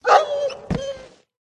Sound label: dog demon death